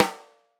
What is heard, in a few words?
Tam Rim, drum